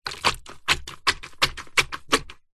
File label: household mop plastic bucket hits stabs rinse water out several; zapsplat